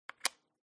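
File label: checker place, backgammon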